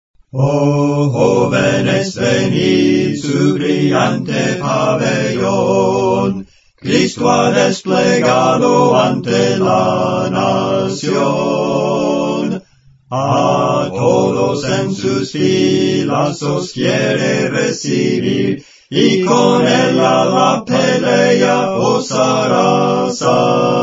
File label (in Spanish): 4 Voces, Cantos Espirituales; Las cuatro voces; 020 ¡Oh Jóvenes Venid!; Clase del Canto